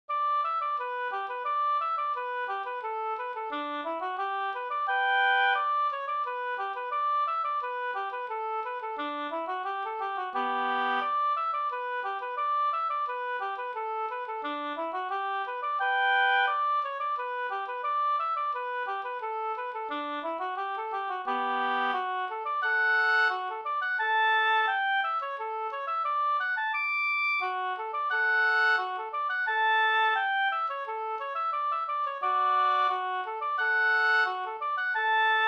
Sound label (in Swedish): gånglåt Kyrkmarsch; Sodra Kind Vg